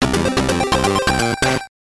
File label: Start Game; power spike pro beach volleyball; gbc